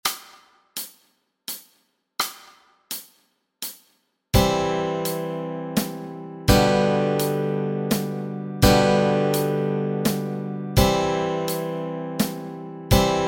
Chords Track, Easy Guitar and Ukulele

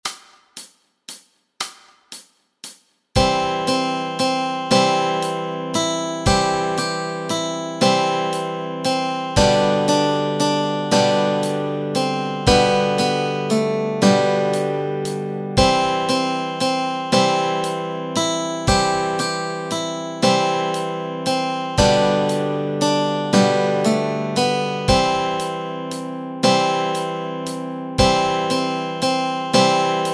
Melody and Chords Track

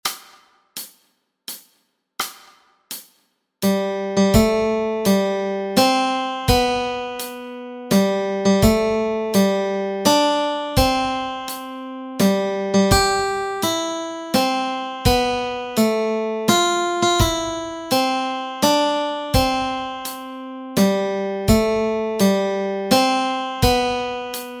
Happy Birthday Melody Track

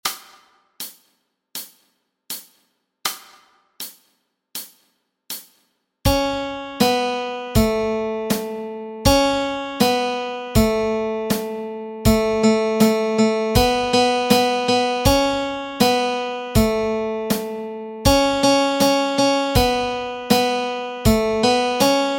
Melody Track

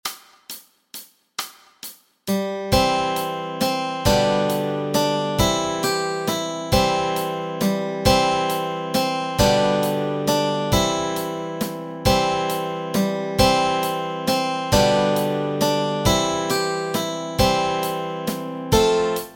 melody and chords

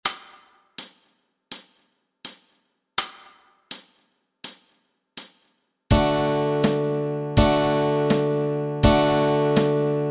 chords, Easy Guitar and Ukulele

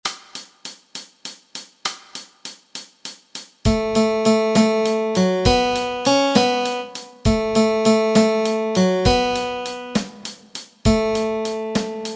melody

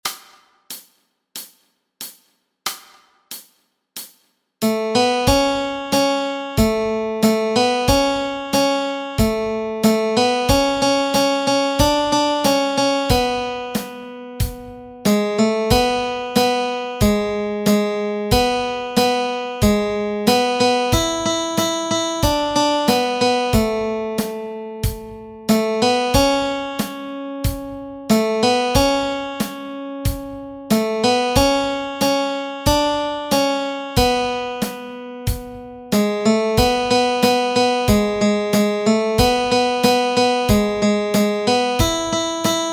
melody

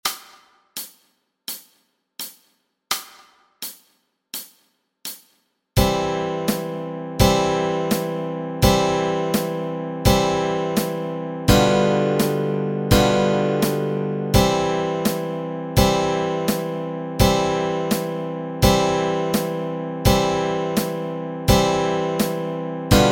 chords, Easy Guitar and Ukulele

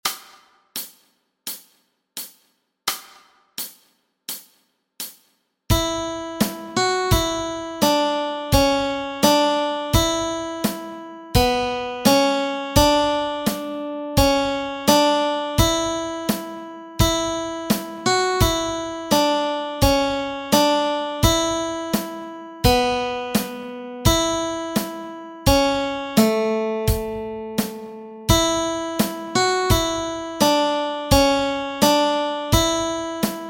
London Bridge Melody Track

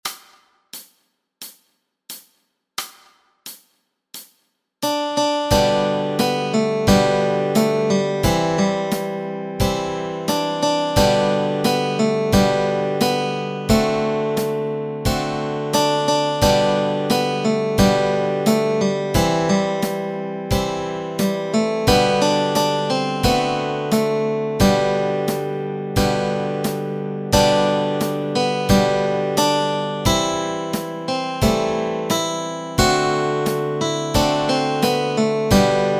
melody and chords

